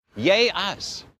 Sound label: yay us